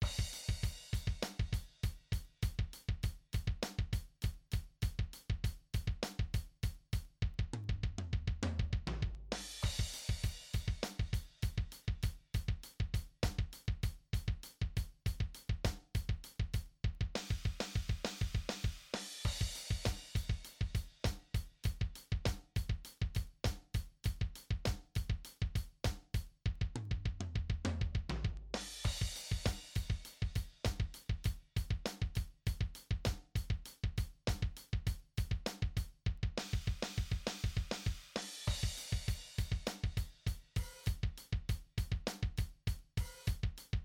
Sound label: Multiple Feet, drumset; Advanced Multiple Feet; Drumset Exercises